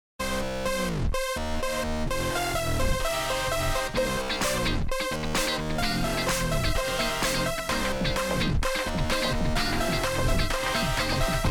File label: Techno